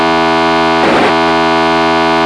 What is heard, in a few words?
rd-lap 19200, scanner